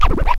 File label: SCRATCHES